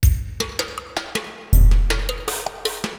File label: DRUM LOOP ambient 160